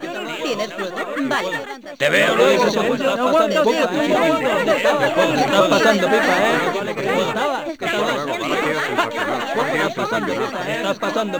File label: hubbub, Crowd, Spanish